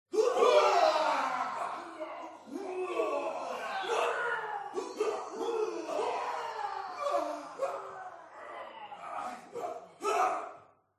small male group of fighters react to painful hits long; Sound Ex Machina